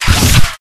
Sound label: Weapons Rocket Launcher